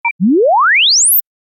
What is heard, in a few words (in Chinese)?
滑频信号时长 1 秒且含触发音